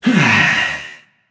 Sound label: grunt attack, voicepack; smite; jorm